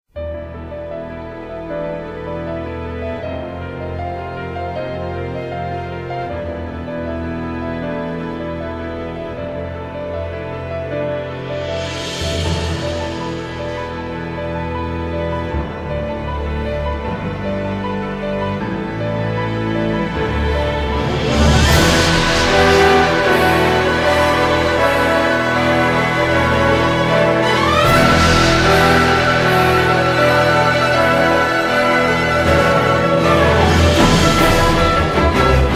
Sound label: Instrumental symphonic ver.; Uchu Engineer; Steins Gate Symphonic Reunion